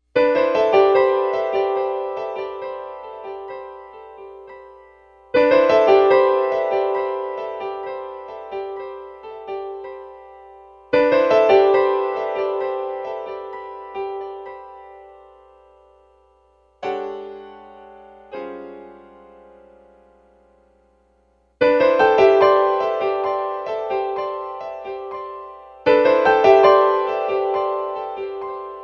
piano

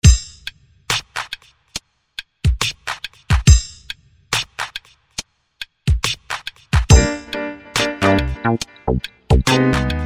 Water

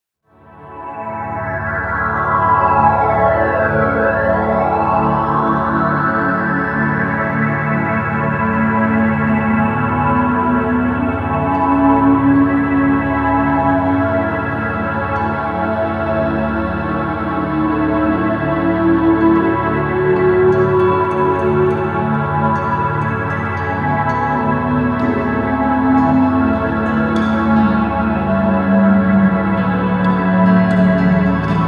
Ambient; Obviously Ambient

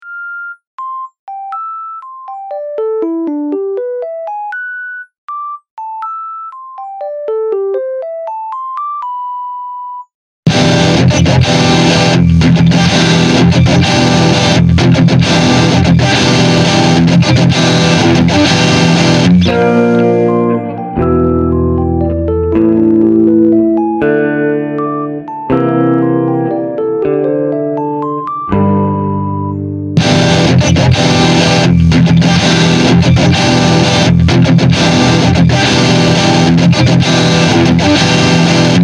Fake Jazz; (I Can't Even Play) Fake Jazz

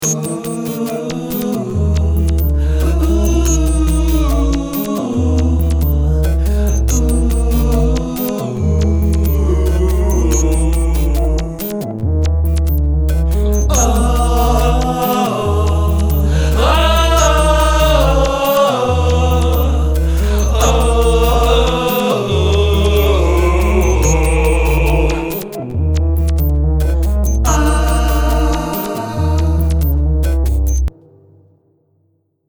Asshole Chorus

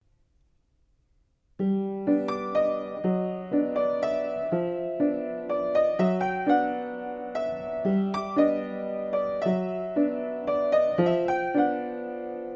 Pno Improv

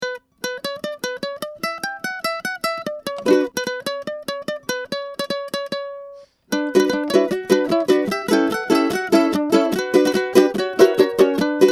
Balalaika; Balalaika Crap

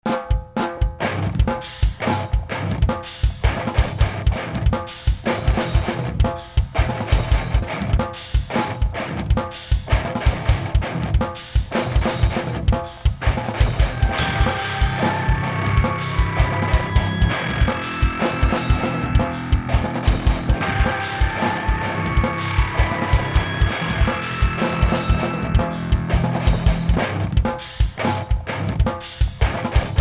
Sound Check

